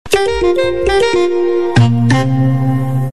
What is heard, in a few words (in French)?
Jingle decro locale; RTL